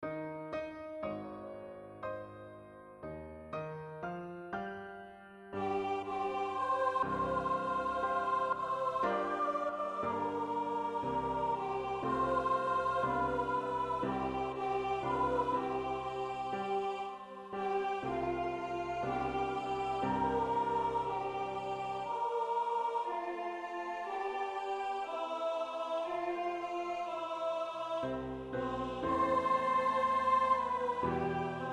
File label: alto